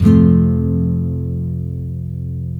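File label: NYLON, F MAJOR DOWN, CHORDS; Spectrasonics; Hans Zimmer Guitars VOL-1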